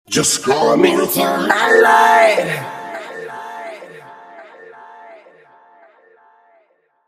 Back Vocals Harmonics

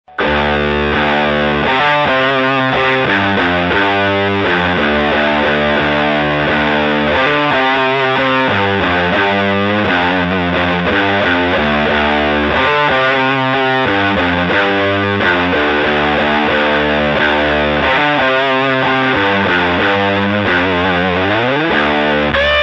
fat mosquito fuzz deucetone; prs

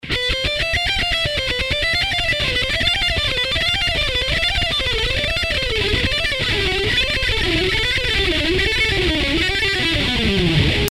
Huge gain

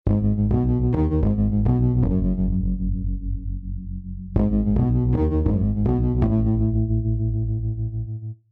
tremelo grind comp

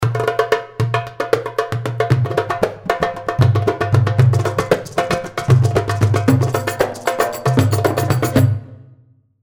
Nubian Samba 115, darbuka

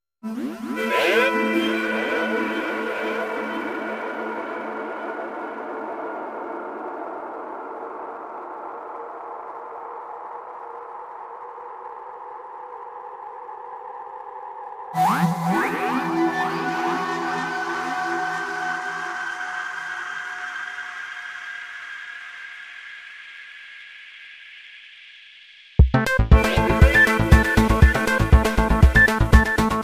Seqs Plus Noise

